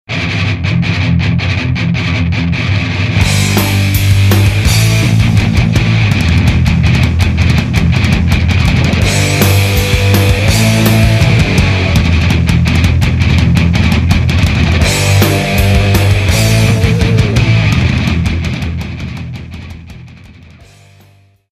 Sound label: Modded Rectifier